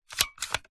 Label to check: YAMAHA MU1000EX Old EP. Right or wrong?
Wrong